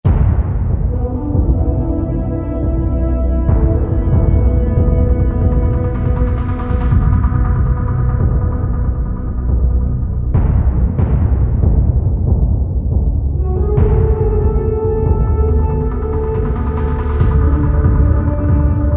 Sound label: Loading Screen; Split/Second